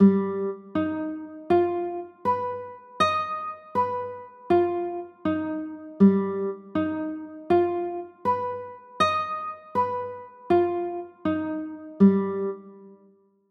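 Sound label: G sharp 7 chord, guitar; g 7 sharp 5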